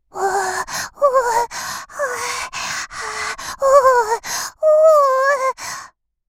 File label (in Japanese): おぉぉぉ… おほぉ はぁっ